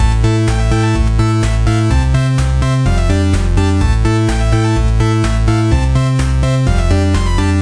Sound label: background music; Race Mode, a mini-game in Confluence whiteboards